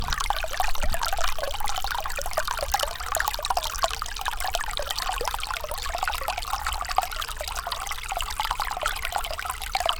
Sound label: water